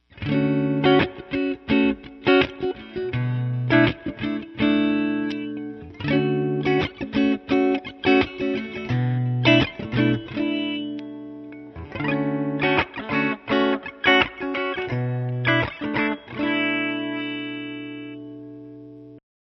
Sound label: Blender HB Clean 3 way